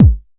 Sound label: BD 909 Tape Medium